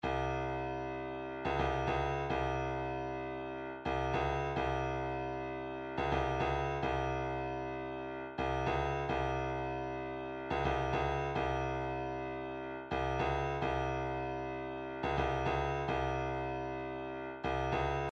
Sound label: 106 kick